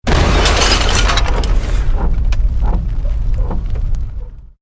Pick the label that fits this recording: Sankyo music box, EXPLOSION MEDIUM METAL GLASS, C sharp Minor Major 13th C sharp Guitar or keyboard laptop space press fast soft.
EXPLOSION MEDIUM METAL GLASS